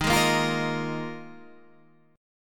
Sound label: D sharp Suspended 2nd Suspended 4th D sharp